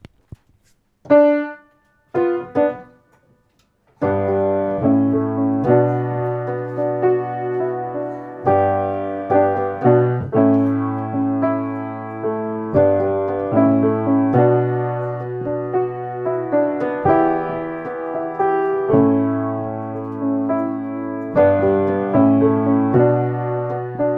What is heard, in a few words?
Piano Idea